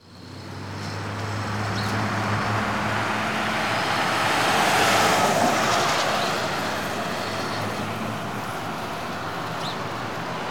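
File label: sounds of cars, traffic jams and car traffic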